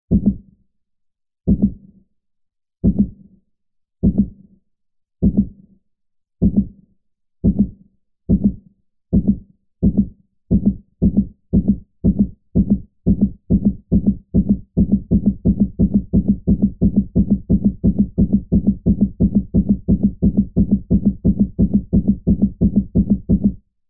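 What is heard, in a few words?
Heartbeat Speed Up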